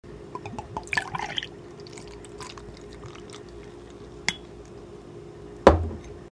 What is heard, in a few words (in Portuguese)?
servindo, Sons domésticos